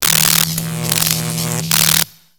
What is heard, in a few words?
Robot Electro Arcs; Lego; creatures mechanical